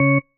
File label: marimba tissue; ゆめ2っき